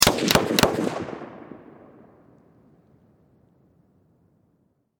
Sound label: Warfare Machine Gun M16 Triple Shot Distant Perspective